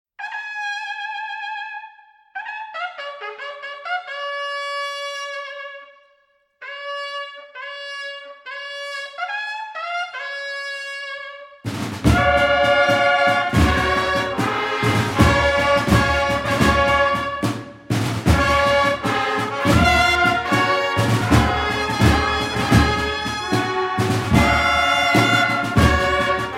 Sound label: Marcha Real